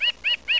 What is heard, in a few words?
nuthatch whi